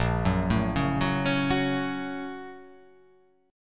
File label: A sharp Minor Major 7th Sharp 5th, down